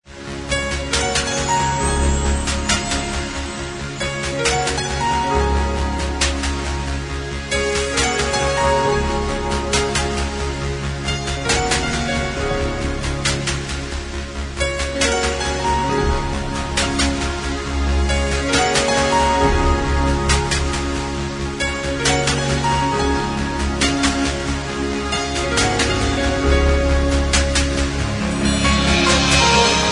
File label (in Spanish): triunfo ganar triunfal